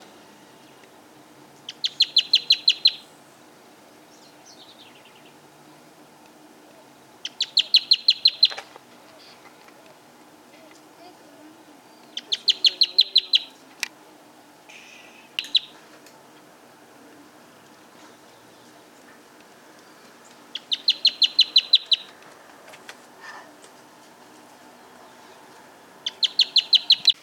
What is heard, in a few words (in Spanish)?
bandurrita chaqueña voz, aves